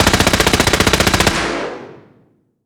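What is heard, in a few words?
Weapon Rifle Laser Gatling Fire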